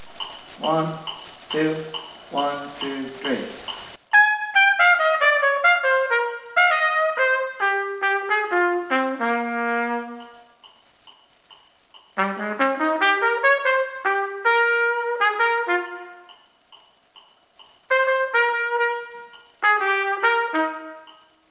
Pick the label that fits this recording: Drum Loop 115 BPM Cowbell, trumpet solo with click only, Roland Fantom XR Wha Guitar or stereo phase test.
trumpet solo with click only